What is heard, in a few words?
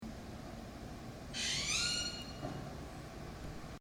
birdcall